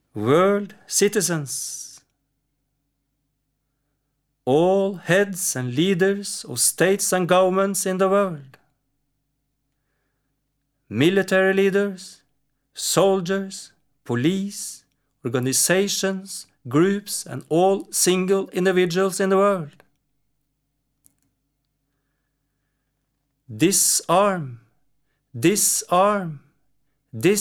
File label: global disarm call vocal